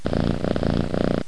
Cat meow

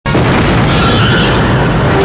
hit, Predator is hit and roars!

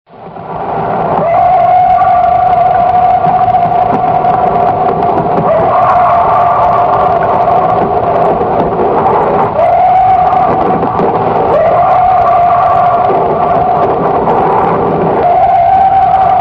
bf cry, Bigfoot scream

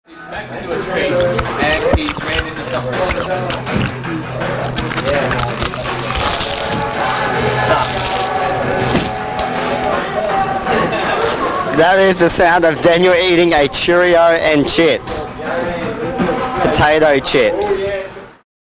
eating

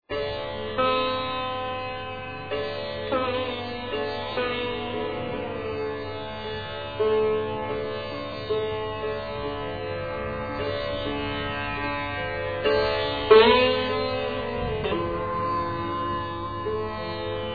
sitar impromptu

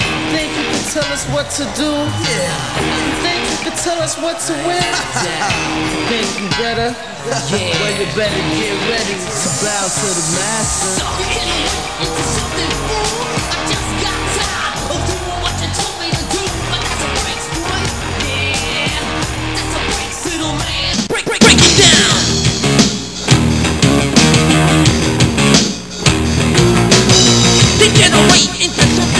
IMMATURITY MUSIC

